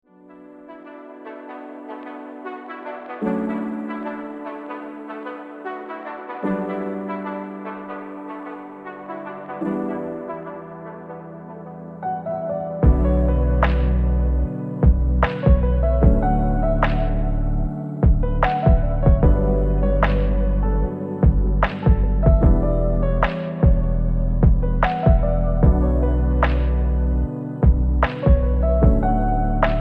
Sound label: instrumental lofi